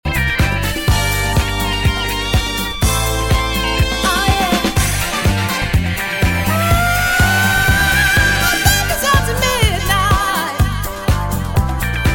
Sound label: Disco; Disco Deluxe; SLEEKE DISCO DIAMONDS